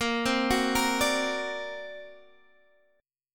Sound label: D Augmented 7th A sharp, guitar